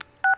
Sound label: Cell Phone Button Beep; SFX Kit; Foley - Misc